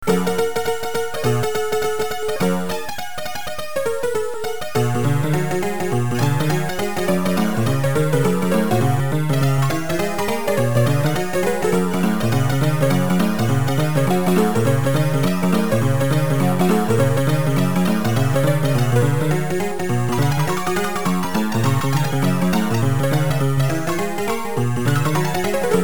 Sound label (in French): boogie-woogie du pianola; Maupiti Island